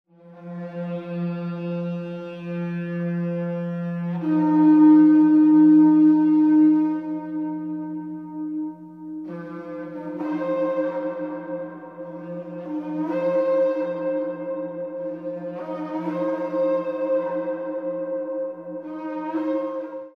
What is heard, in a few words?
End Blown Bronze Age Trumpet; The Kilmartin Bronze Age Trumpet